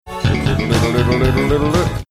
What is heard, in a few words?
singing along